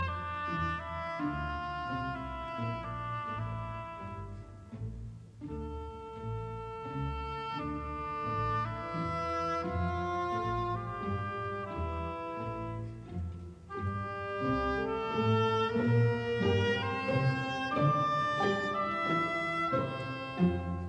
Symphony in B flat major, Adagio; Symphony No 5; WAB 105

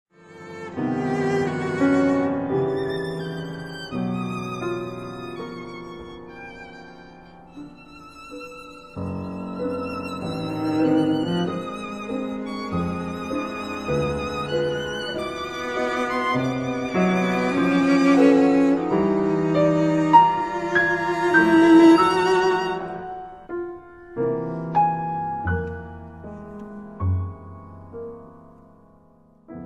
Trio for violin cello piano Mesto; Helps

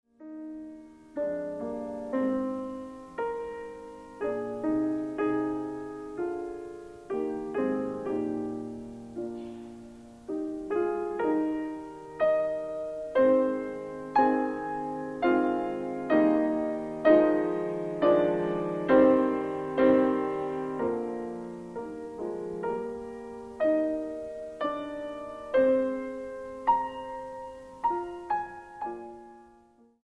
Bagatelle for piano in E flat major

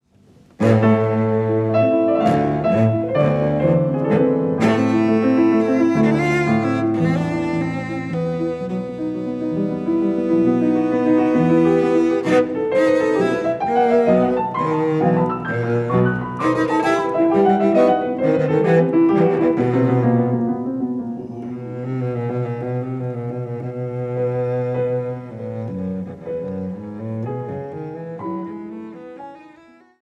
Cello Sonata in A Major, Allegro ma non tanto; Op. 69; Beethoven

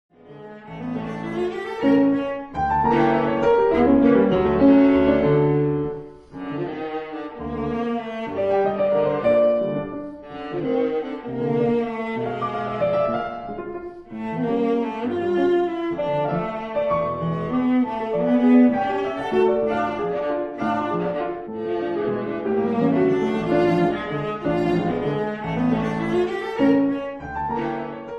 Phantasiestucke For Viola Piano Mabig bewegt; Fuchs; Op 117

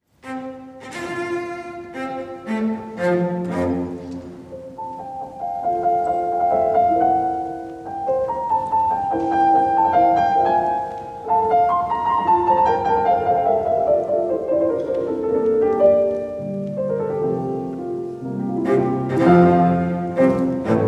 Horn Sonata in F Major Op. 17 I. Allegro moderato; Beethoven

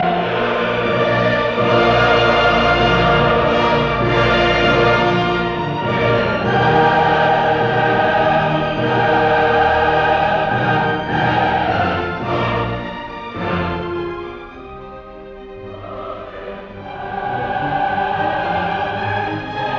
Mass in D major Credo Allegro ma non troppo; Op 123; Missa Solemnis; Bruno Walter

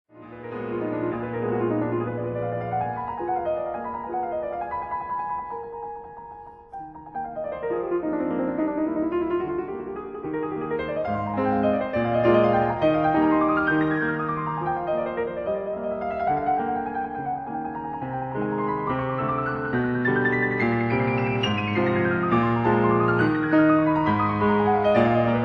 Impromptus for piano in E flat major Allegro

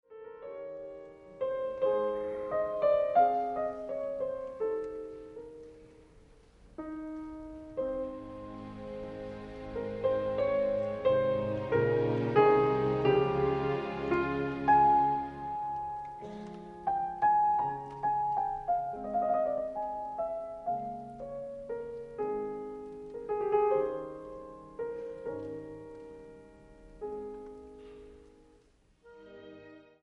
Piano Concerto in C major Largo

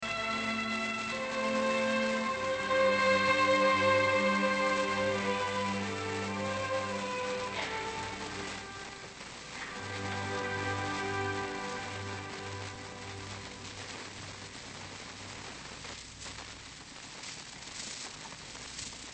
Piano Concerto in G major, Fragment

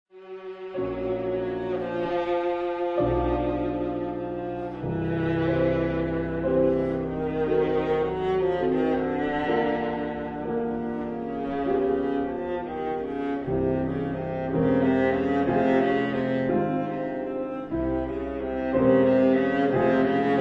Albumblatter For Viola Piano Lento; Sitt; Op 39

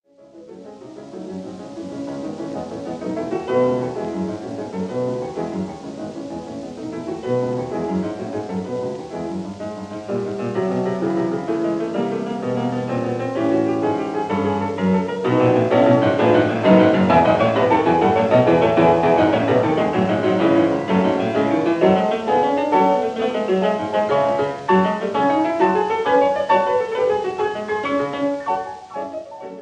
Symphonic Etudes for piano Presto possibil; Schumann; Op. 13; Etude 6; Presto possibile

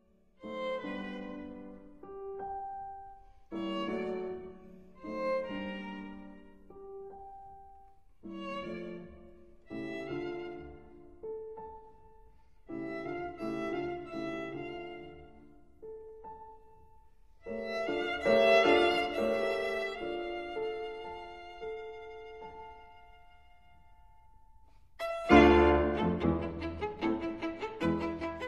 Sonata for violin & piano in A major Adagio; No. 9; Kreutzer; Op. 47

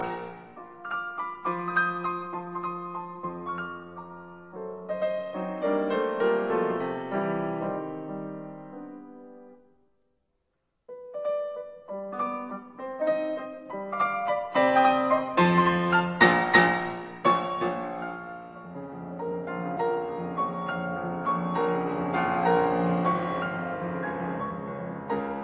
Piano Sonata in B flat major Scherzo Assai vivace; No 29; Op 106; Hammerklavier

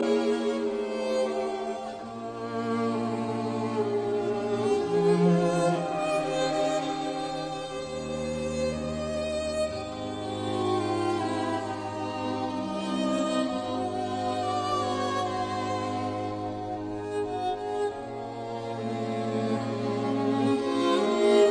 String Quartet

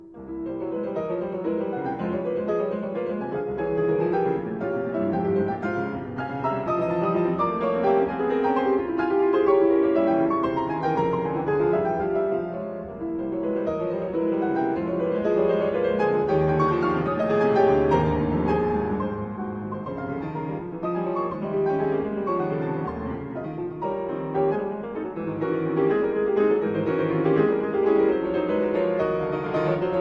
Studies on the Chopin etudes in F minor Waltz; No 27; Op 25 No 2

